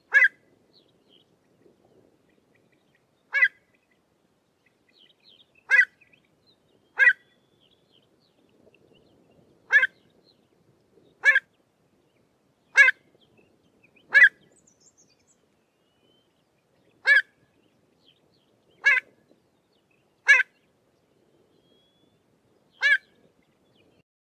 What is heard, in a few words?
Pied avocet; نوک خنجری